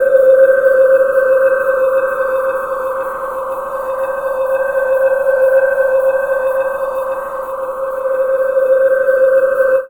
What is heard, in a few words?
Synth Atmos; Best Service ProSamples vol.36 - Chillout